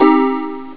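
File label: Chime Minor; AMOS MUSIC 1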